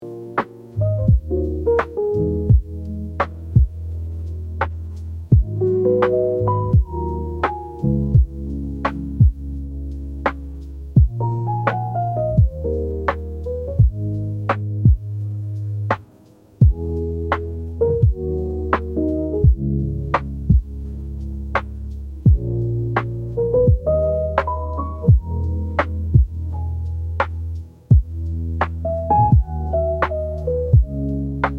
midnight stroll, dlya raboty

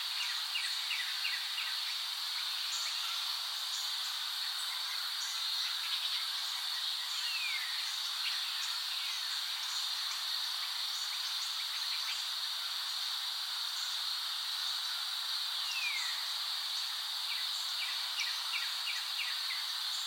living summer morning forest

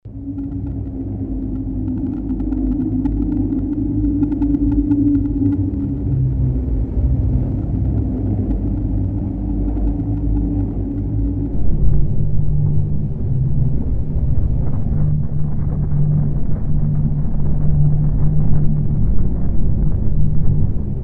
the rumble of the wind on the planet mars

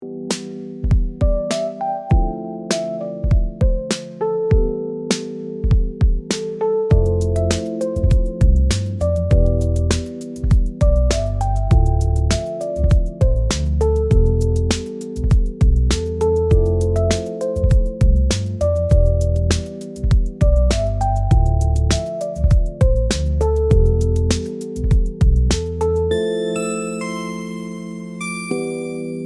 bezmyatezhnaya

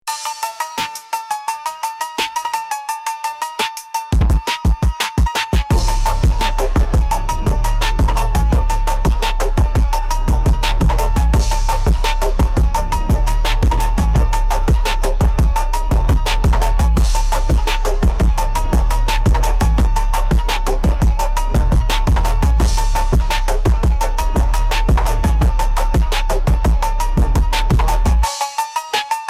vibrant funk fusion

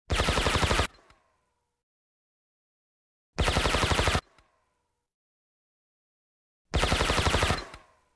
automatic shots burst with a silencer; zvuk glushitelya

